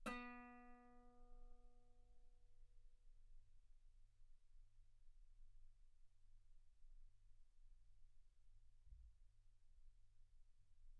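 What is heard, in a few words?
Guitar ff B string B3; sul B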